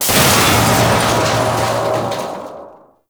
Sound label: Water Pipe Burst; The New War; Ambience; Narmer Outpost